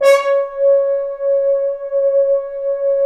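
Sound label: brass, FHns Accent; Roland L-CD702; Accent-Swell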